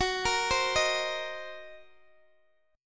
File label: Fsharp 6th Flat 5th down Guitar DADGAD